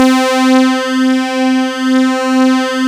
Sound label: mini bass, analog synth bass; Roland L-CD701; Synth Bass 2